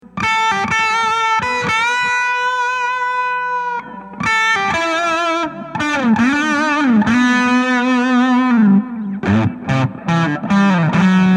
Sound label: Colorsound OAI tone